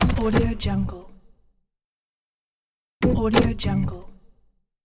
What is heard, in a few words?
user interface mechanical error; inspectorj